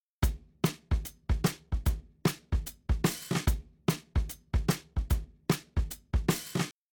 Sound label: Drums, shuffle drums; WDR2